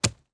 Industry Tool Shovel Dig

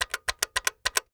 Phone Receiver Button Clicking; AdobeCC

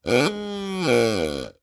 Sports Buck Caller Call High Low

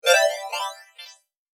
Multimedia Internet CD-Rom Flash Beep Multiple

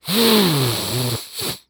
Human Male Snorting

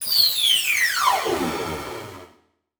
Title Transition Descend; Adobe; Production Element

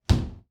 Shower Close Door, Household

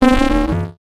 Wipe Cartoon; Multimedia Internet CD-Rom Flash

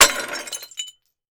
Ceramic Debris Hitting Wood Boardwalk; Crashes

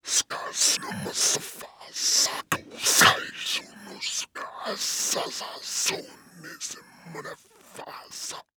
Horror Demonic Possession

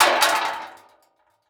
Crash Aluminum Tray Bang; Crashes

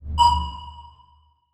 Multimedia Internet CD-Rom Flash Beep Low Pitch

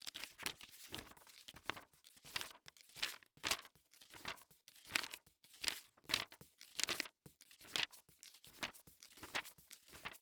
Household Book Hard Cover Book Flip Page Fast

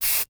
Household Hair Spray Can Spraying Short

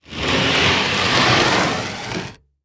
Foley Movement Cinder Block Slide On Wood Floor

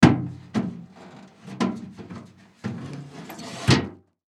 Hand Grab Garbage Can Metal; Foley

